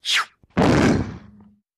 Rock Falling Whoos; rocks

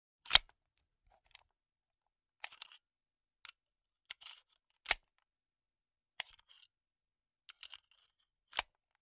45 Colt Magazine Inse; foley gun movement

